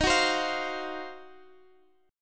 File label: Eb Minor 6th Add 9th, Guitar Standard; strummed; chord; JGuitar